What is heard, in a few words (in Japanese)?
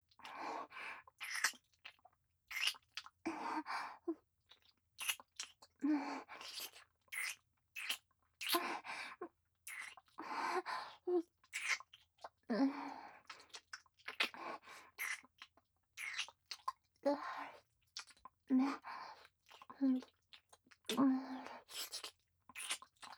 正面近い・乳首舐め・普通; リーシャ